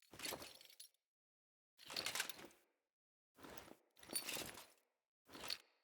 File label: Foley Idle Sit; Friendly; Jotar